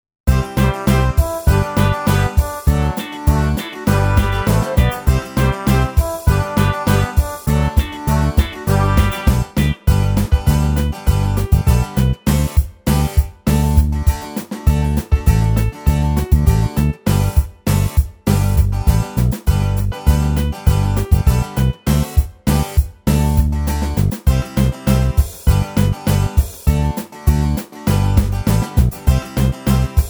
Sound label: Karaoke